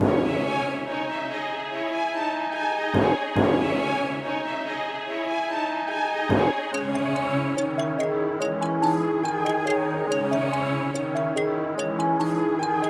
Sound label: 143 BPM C# Min, Hip Hop Melodies Orchestral; Rome